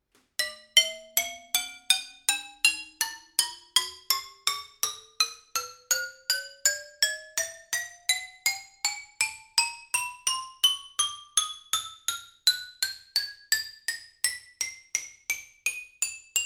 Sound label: lithophone brass